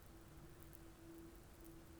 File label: pulses, BALAENOPTERA; MONACO 2022-07